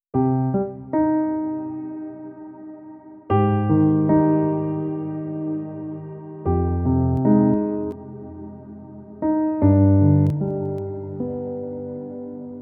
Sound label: dramatic strings; By Burak Sound Bank